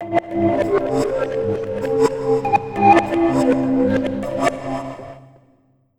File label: SREV PERC, REVERSE FX; USB Soundscan vol.60; Asian Traditions; AKAI